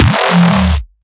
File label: bass 140; Ganimed; base pack